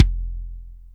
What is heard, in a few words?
Kick, Akai MPC500; Toolkits